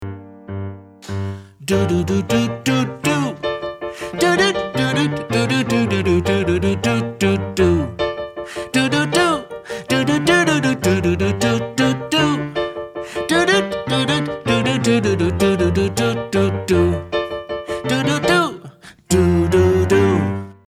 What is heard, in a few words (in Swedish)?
tenor